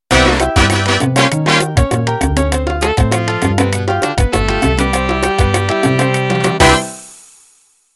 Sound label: Salsa End